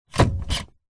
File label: car brake pedal press down then release with foot engine off; vehicles; zapsplat